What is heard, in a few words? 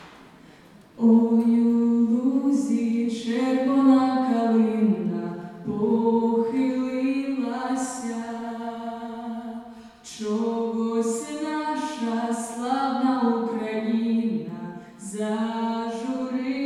chant